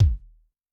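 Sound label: bass drum, Kick, Yamaha DTXpress-II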